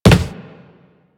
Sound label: Walk Wood, Footsteps; Warframe; Operator